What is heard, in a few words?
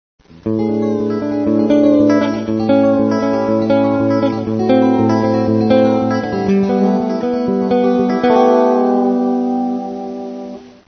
bending, guitars